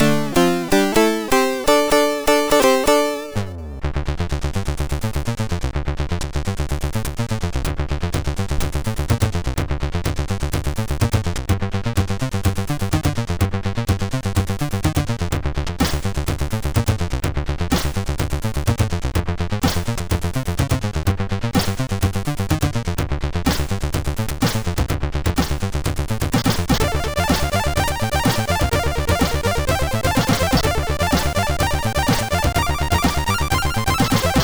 Jam, sid MOS6581R2

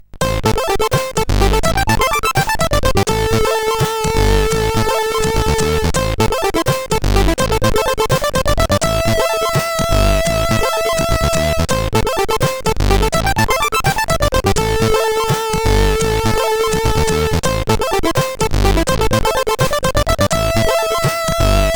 Pumas Run 2 voices, CSG8580R5

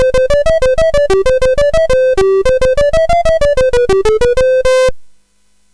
sid CSG8580R5, GAMES; Arctic Shipwreck

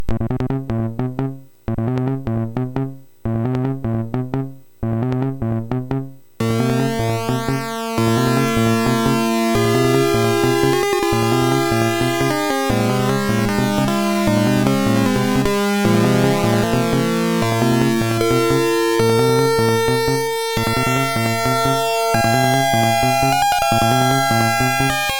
Bass Theme, sid MOS6581R4; HVSC

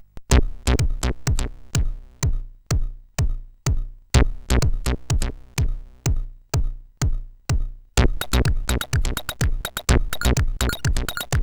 Techno House, sid CSG8580R5

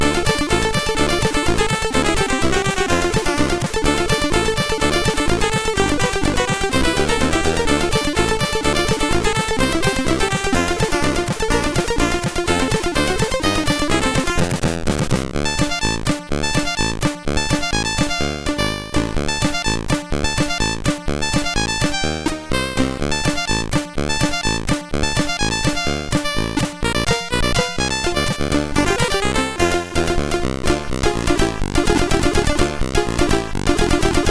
MOS 6581R3

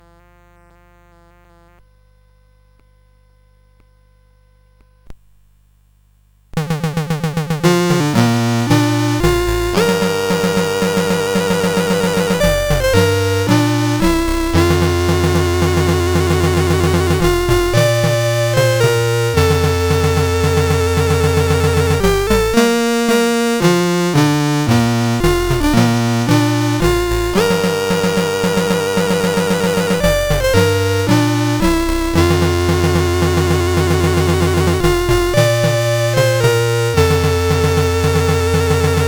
National Anthem CSG8580

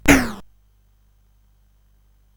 Dark Castle, CSG8580R5

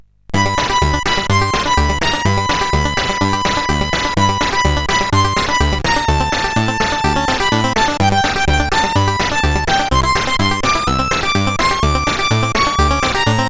Synth Sample, CSG8580R5

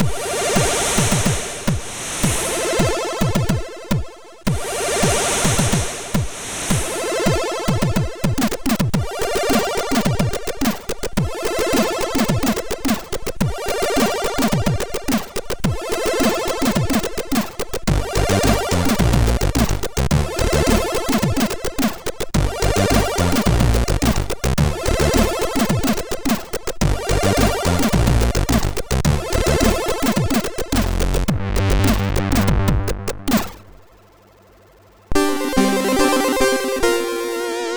Shuffle, MOS6581R2

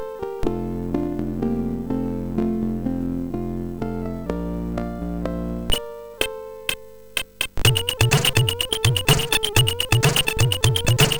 Noise, sid MOS6581R4; hvsc

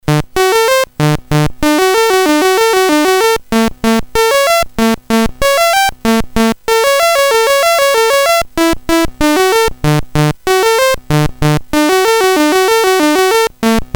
BASIC sid CSG8580R5, GAMES; Spiders

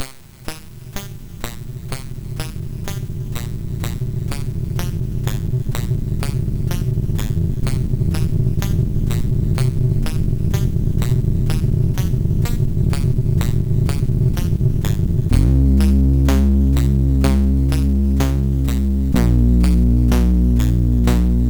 Level Music, sid MOS6581R4

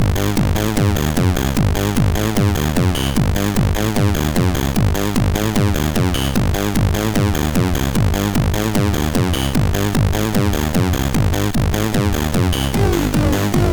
Buzzer, MOS6581R4; sid

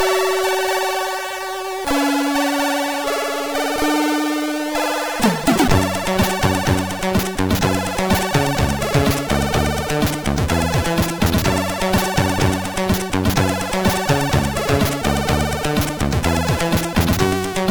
Asian Way, MOS6581R4; hvsc; Moon

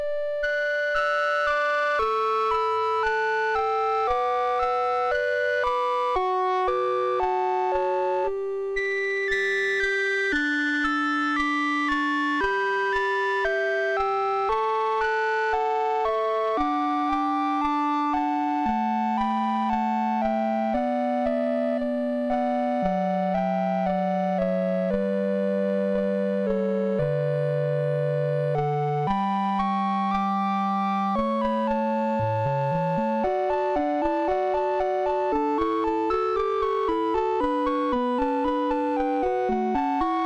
Canon, MOS6581; MOS6581R4

